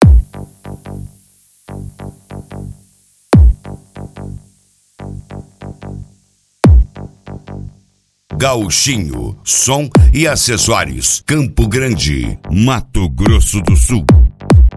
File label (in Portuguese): som automotivo; gauchinho som e acessorios e magno